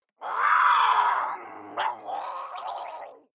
water monster female gargle scream angry; koerpergeraeusche; comedy-cartoon; soundpool

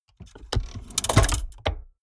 mechanics medium snap

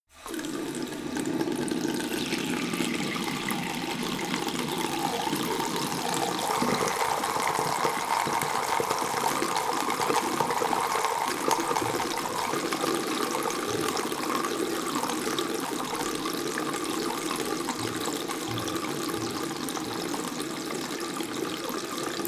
tap flow medium long, Badezimmer; haus garten

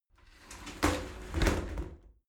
shower slide door close regular

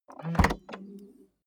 car bmw e46 exterior trunk open

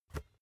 basketball catch gentle

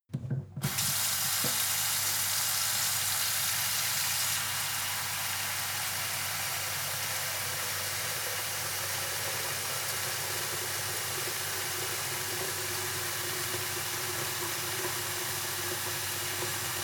sink water pot fill

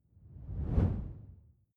whoosh simple low; swooshes-swishes

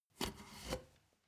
step ladder wood scrape soft single; schritte

